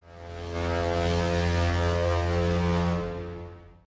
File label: ship